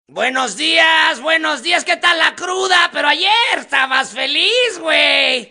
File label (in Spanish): Despertador